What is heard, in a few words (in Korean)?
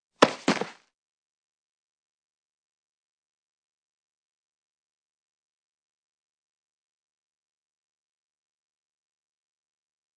Fights, 싸움-전투; 헐리웃특수효과음